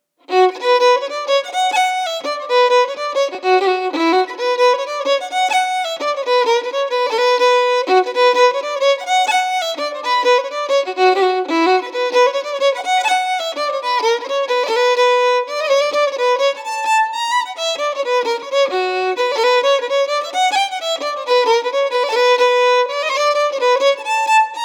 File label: Coleraine Jig